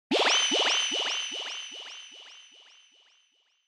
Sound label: Motion Pop